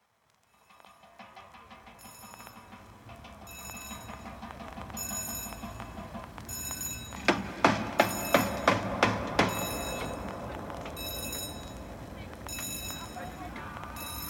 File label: SOUNDSCAPE; Stopera